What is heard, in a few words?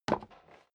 step sneaker wood creak single stair down; schritte